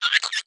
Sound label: vox hang, creatures, snark